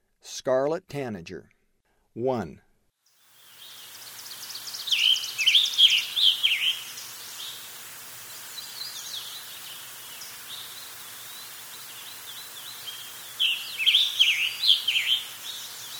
Scarlet Tanager; S. America; Eastern Lowlands

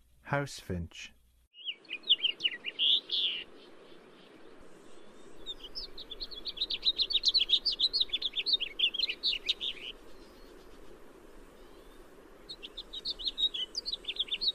House Finch, Songs of Birds of North America

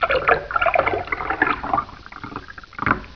water

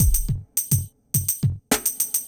east coast shuffle 105

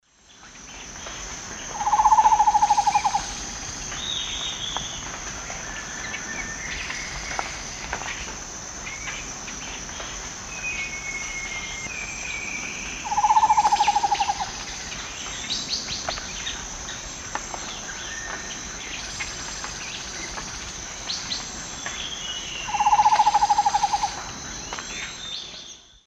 Mytmothera campanisona, call; S. America; Eastern Lowlands

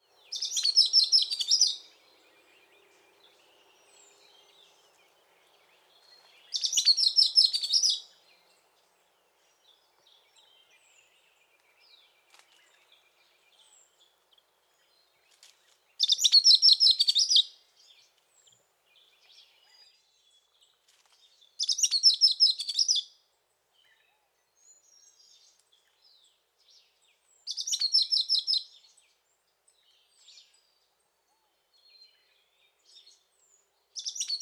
Song, greeting call, and calls, Birds

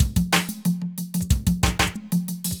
conga hop 92

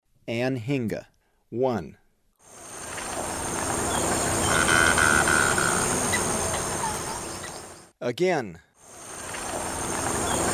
Anhinga, Birds of Ecuador; S. America

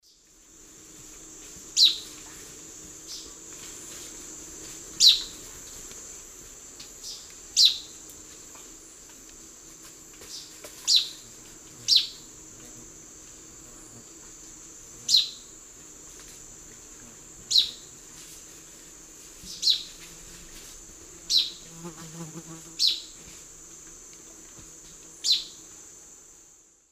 Myrmoborus myotherinus, chip calls; S. America; Eastern Lowlands

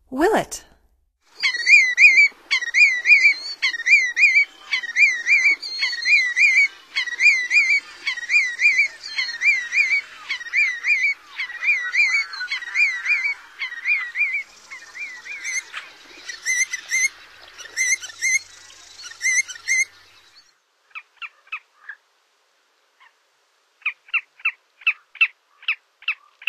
Willet, Songs of Birds of North America; Stokes

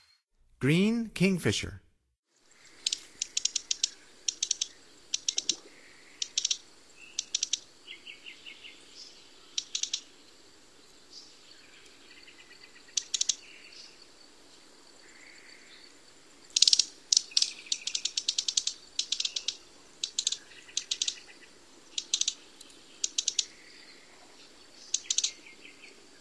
Green Kingfisher; North America; Stokes

